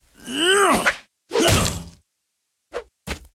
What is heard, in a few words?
Mace Attack